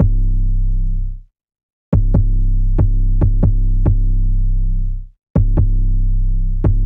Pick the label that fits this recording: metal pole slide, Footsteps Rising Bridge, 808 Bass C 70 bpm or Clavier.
808 Bass C 70 bpm